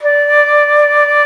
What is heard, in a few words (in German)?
FLOTE, Alpine Volksmusik; Best Service